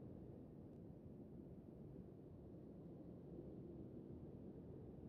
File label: amb Menu